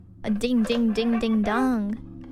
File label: ding ding ding ding dong